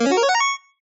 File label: Ball, Skee-Ball; TheStable; Pyralix